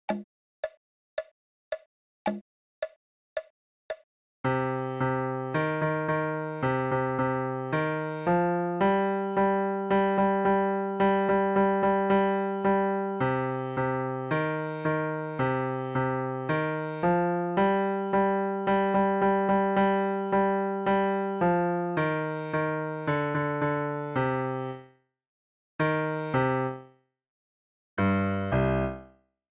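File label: Drill Ye Tarriers, piano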